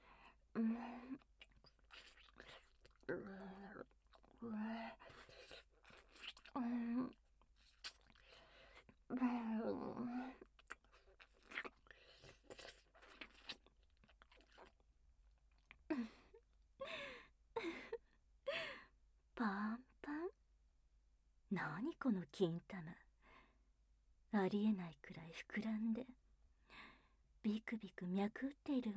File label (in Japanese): 玉舐め